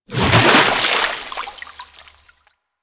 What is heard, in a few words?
Kraken Tentacle Water Splash; Warframe; Warframes; Pirate